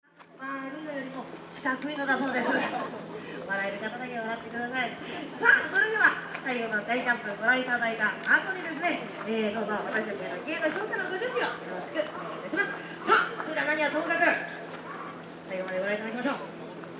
Street Performer Public Show Female Performs Tokyo Japan; Street performer in Tokyo, Japan; Crowd Sound Effects